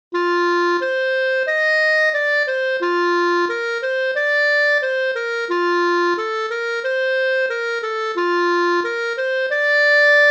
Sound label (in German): alter Aelplerjodel instr